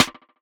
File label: Snare Strike; One Shots; Drums; Ableton Live 12 Lite; Core Library